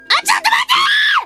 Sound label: polka